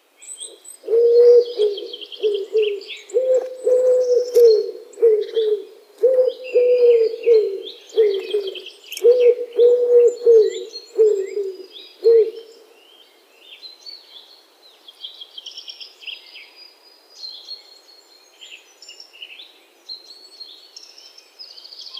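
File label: wood pigeon voice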